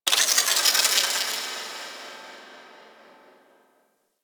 Cephalon Tech Small, Ambience

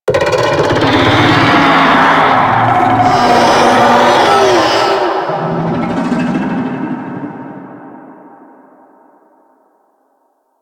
Infested Golem Vocal Death; Warframe

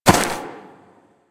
Ram Step Single Grass; Animals; DE; Warframe; Quests; Duviri

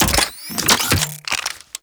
Briefcase Launcher Executive Idle Fidget; Warframe; Weapons; Corpus

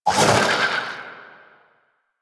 Vocal Pain; Warframe; Sentients; Conculyst

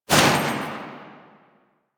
Shot, Weapons; Furis Vekesk; DS Anniversary

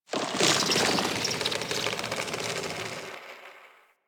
tendril tree creaks; Warframe; Infested Eris; Ambience

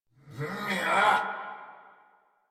Attack Effort; Warframe; Dax Knight; en

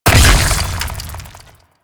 Infested Bone Blade Spike Burst C; Warframe

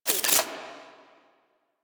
Screen Glitch; Warframe; Mini Games; Caliber Chicks Minigame

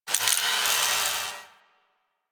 Corpus Ship Rolo Dex Swipe; Ambience; Props; Warframe; Corpus Ship Remaster

